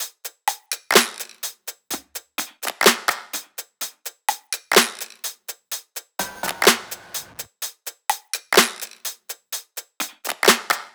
top loop, Drums, Loops, Top Loops, Pop; Slate; SD PASADENA Pop Sample Pack

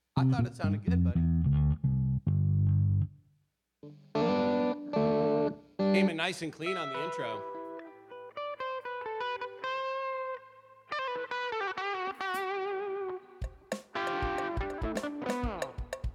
Vocals

